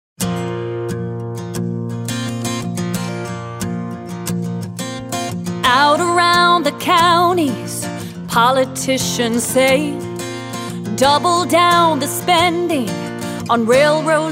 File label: Smooth Country